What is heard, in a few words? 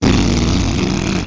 Pig Tongue; Angry Birds Space